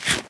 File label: eat; minecraft pe 0.6.1